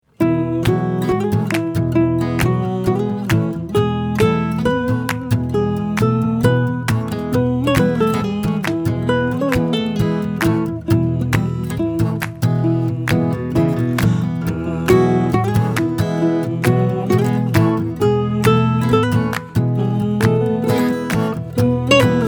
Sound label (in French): INSTRU